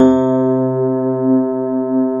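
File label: grand piano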